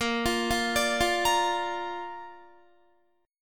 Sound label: A sharp Major, Guitar; arpeggiated; tuning 4F,4A,5C,5G,5C,6E